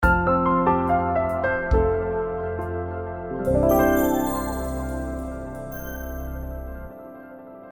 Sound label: boot sound; ZTE; R750